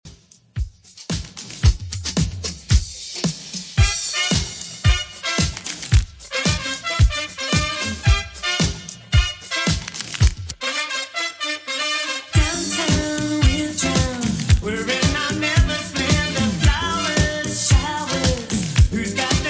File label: 80s 112 bpm